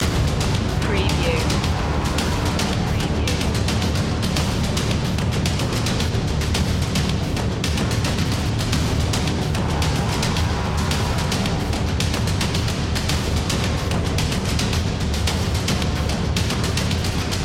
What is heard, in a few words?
dystopian machine loop